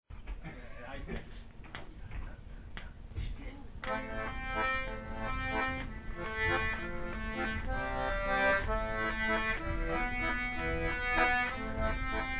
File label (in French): Contrechant 2 valse ecossaise; rencontres-2013